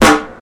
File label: fresh dry steel snare drum d key; snare drum samples